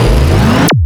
engine attack chargeup

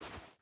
footstep hide sand walk; Rust

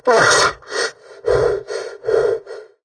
redout long; War Thunder

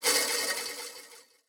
spinner wheel spin start; Rust

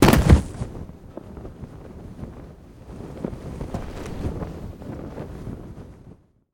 Personnel Crew On Chute Landing; DCS

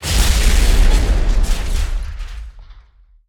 explosion material unit; War Thunder

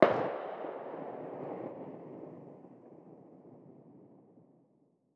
extra gunshot really distant; Rust

